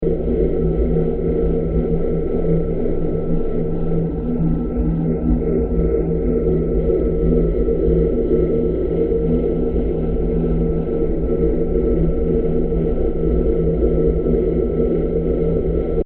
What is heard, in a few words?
Dark UFO Ambience 120bpm; Sound Design